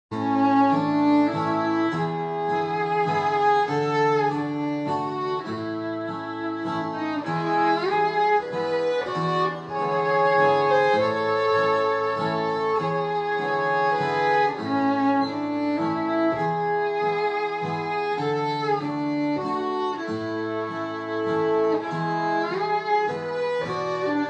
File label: velvets waltz, Texas Fiddle